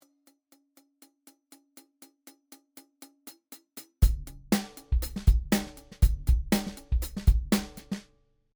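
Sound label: close tight tip HH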